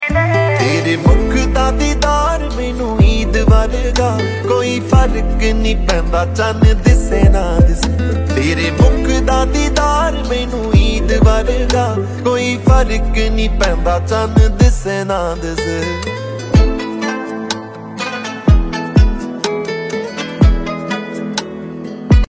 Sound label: Ringtone